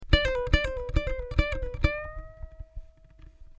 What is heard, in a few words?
hammer-on, ukulele